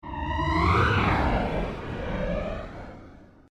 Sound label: Created Cyber Whale Call, Alien Sound Effects